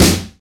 sharp acoustic snare f key, snare drum tuned to the F note. Loudest frequency: 2688; snare drum samples